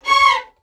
str f#, strings; house; PS-28 Vocal House Samples

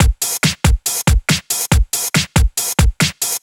Breakbeat 140 BPM

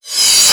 Cymbals Reverse; Vengeance Samples

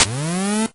Arcade Riffs

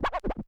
Cuts, Vinyl Record Samples

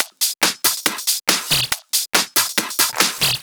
Loop 140 BPM; vengeance essential club sounds-3

